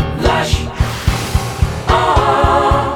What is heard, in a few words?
ahhhh